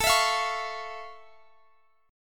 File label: F Augmented Major 7th, chord